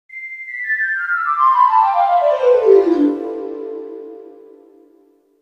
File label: Magic